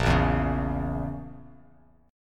Bb Diminished Bb down Bass Standard; strummed; chord shape

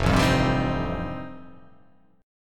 F 9th F, chord

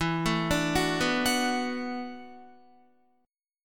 E 9th Suspended 4th E down Guitar; arpeggiated; chord; JGuitar

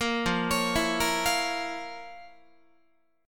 F Major 7th Suspended 4th Sharp 5th F, Guitar; arpeggiated

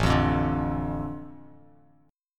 A Suspended 4th Sharp 5th, Bass Standard; Asus4#5 strummed; JGuitar

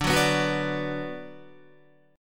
D 6th D down Guitar, chord; D6 strummed; tuning 4E,4A,5D,5G,5C,6F

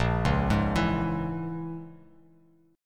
Bb Minor 7th Flat 5th, Bass Standard; Bbm7b5 arpeggiated

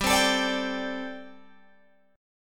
G Suspended 2nd Suspended 4th G, Guitar, Open G

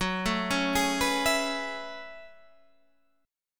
F sharp Minor 11th

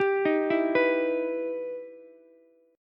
E Minor Major 7th, Ukulele; 12,15,12,14; arpeggiated; JGuitar

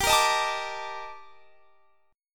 D sharp Major Sharp 11th, G

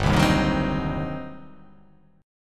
G Major 7th Suspended 4th Sharp 5th, G, down

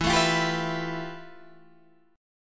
F Major Sharp 11th E

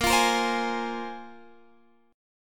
Bb 7th Suspended 2nd Bb; Bb7sus2 strummed

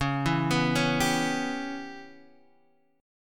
Db Minor 7th Flat 5th, Guitar, Half Step Down

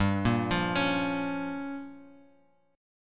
G Minor 7th Flat 5th, Bass Standard; Gm7b5 arpeggiated; JGuitar

